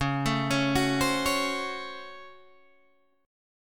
Db Major 7th Suspended 4th Sharp 5th Db Guitar Open G